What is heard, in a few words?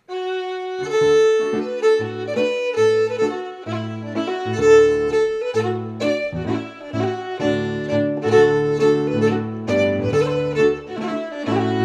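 Reel, Scottish Fiddling; Macglashan col 1797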